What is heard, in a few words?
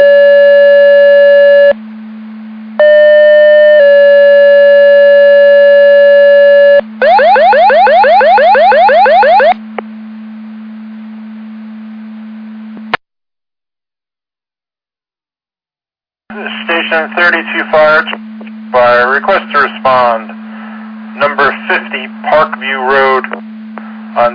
Siren; Andover Twp Boro